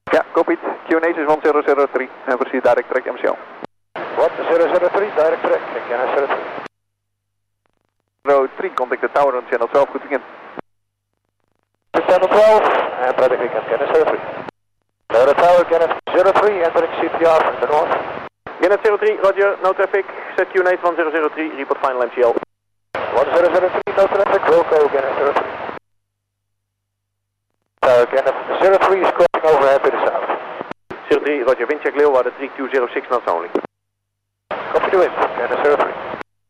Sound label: medevac; gannet03; from ameland to mcl